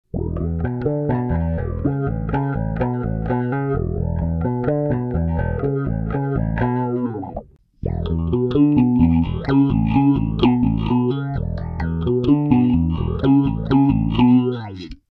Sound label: Pop Phaser